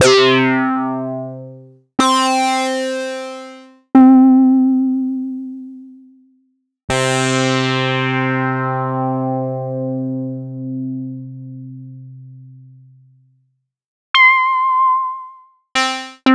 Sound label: ARP Odyssey Keys; Sliced